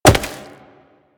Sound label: Wall Jump Rock